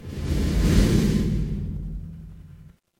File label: transition whoosh